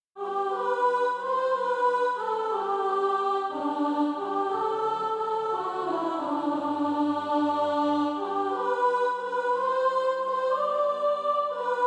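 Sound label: soprano, Practice Music; A Little Talk with Jesus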